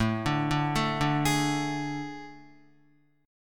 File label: A Major 7th Suspended 4th, Guitar; arpeggiated